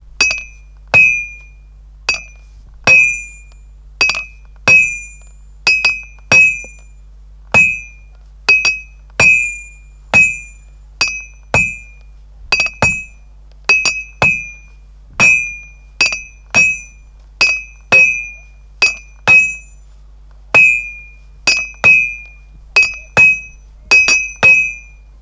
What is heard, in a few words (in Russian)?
zvon molotka v kuznice